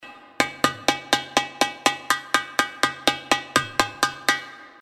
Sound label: tac